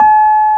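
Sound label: keys Rhodes, electric piano; Super E.P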